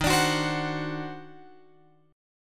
D sharp Minor Major 13th D sharp

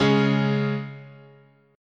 C sharp 5th, guitar chord

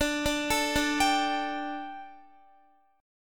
D Suspended 4th D, down, Guitar; arpeggiated; 4F,4A,5C,5G,5C,6E

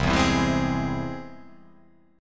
C Augmented down

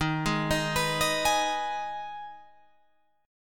D sharp Suspended 4th Sharp 5th, Guitar, Open F; 15,15,15,18,18,15; arpeggiated

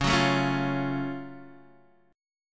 Gb Minor Major 7th Db

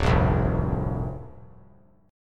D-Minor 7th Sharp 5th D, chord; Dm7#5; strummed

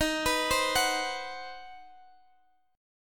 C Minor Major 7th Flat 5th Eb, chord

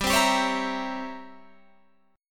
C 7th Flat 9th G

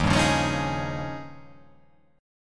D sharp 11th

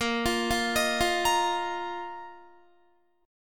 Bb Suspended 4th, Bb, down, Guitar; arpeggiated; 4F,4A,5C,5G,5C,6E

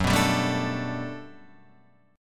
G Major 9th, F sharp

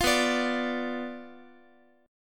B Diminished B down Guitar; Bdim strummed

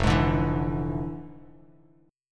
F Minor Major 13th, Bass Standard; strummed; JGuitar

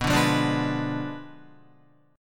B Diminished 7th, Guitar; Bdim7 strummed; 6,5,5,7,8,7; JGuitar

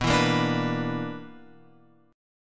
B Minor Major 7th Double Flat 5th, Guitar, Open F; BmM7bb5 strummed; JGuitar

